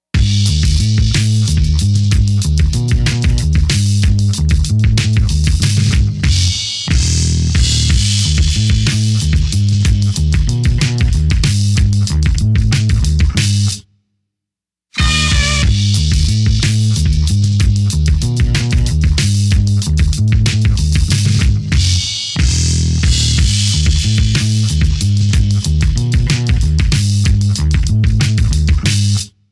Side Quest Instrumental

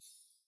sparkle; CrossCode

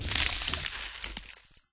frostbite impact; Skyrim Special Edition

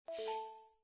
dialogue popup

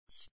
pulldown

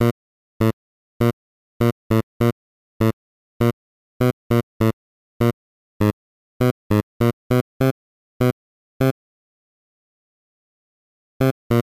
snd Select Character

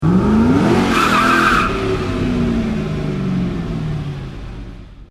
mustang burn; fordmustang

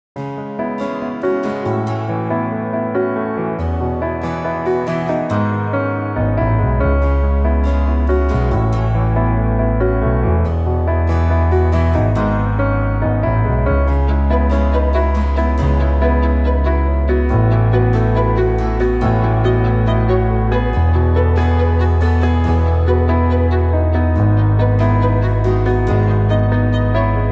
Emotional Acoustic

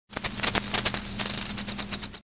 bird Morning; Solitaire

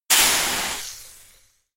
steam; pond5

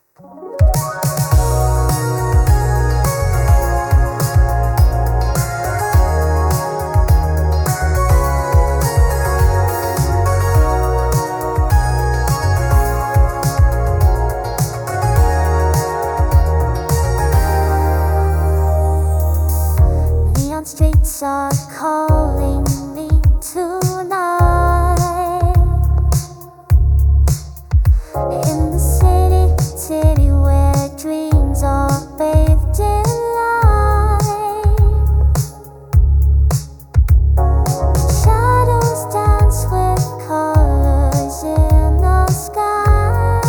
Soft Rain; Spring Bloom

